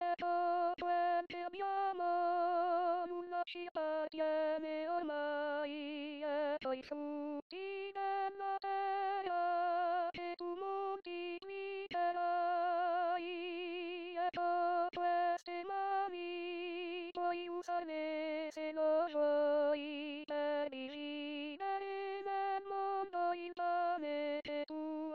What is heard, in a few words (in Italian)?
Ecco Quel Che Abbiamo Voce